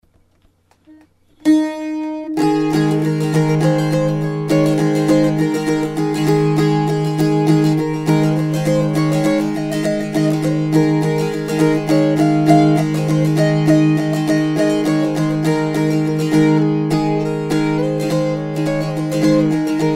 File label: banjola ballad; GoldTone